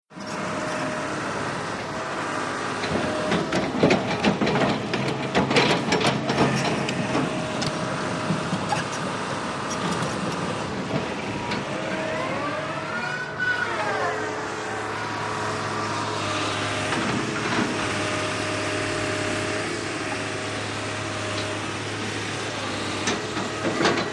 VEHICLE - CONSTRUCTION JOHN DEERE 750: EXT: Dumping into truck, shaking bucket.; 750 Dozer